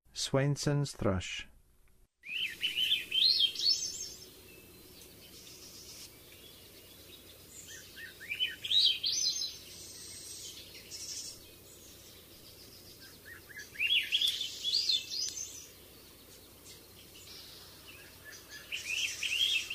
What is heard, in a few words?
Swainsons Thrush; North America